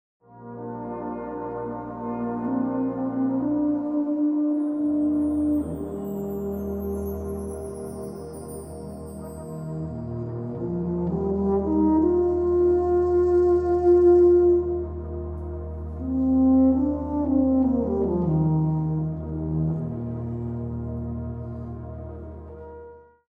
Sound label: bass